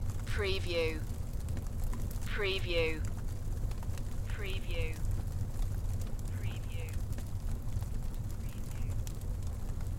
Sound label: Fire Fireplace Loop